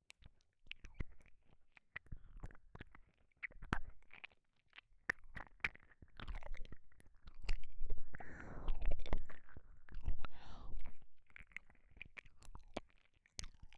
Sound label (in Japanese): 耳舐め 左; 目覚まし音声ループ; 姉穴調教 ～お泊りデート編～